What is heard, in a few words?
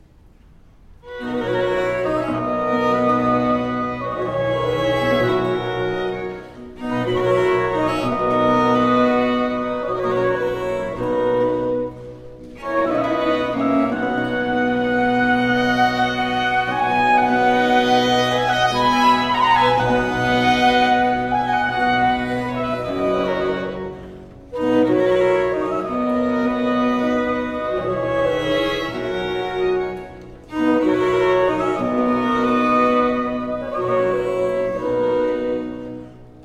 Musette; Les Fetes D'Hebe; Jean-Philippe Rameau; Dances and Suites of Rameau and Couperin